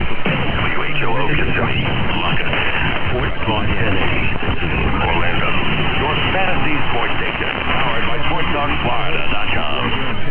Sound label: whoo; Bay House, Reedville, VA; Perseus, 160' DKAZ; Kissimmee, FL; SportsTalk 1080, The Team; ESPN